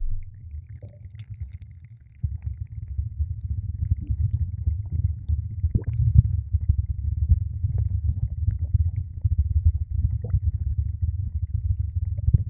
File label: deep pitched muffled repe; sound effect; body horror